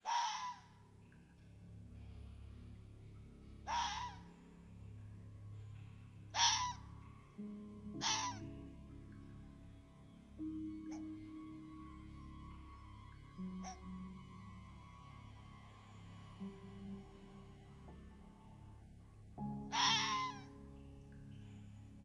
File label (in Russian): ryijaya lisa golosit istoshno v lesu